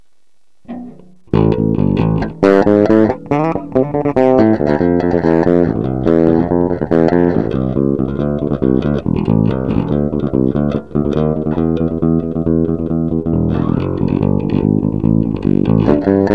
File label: Active PU Bridge EQ Flat